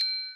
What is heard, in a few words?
sword